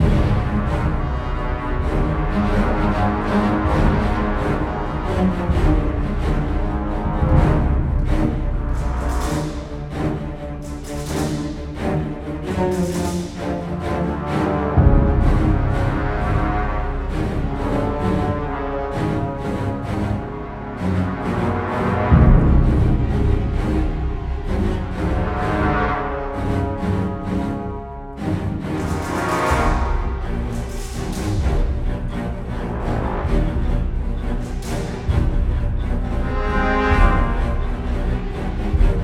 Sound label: Action Loop 130BPM, Classic Horror Sci-Fi Music; Dead Ark